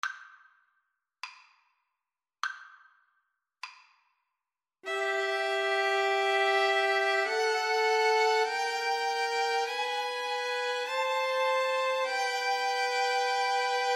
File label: aequale VLN TRIO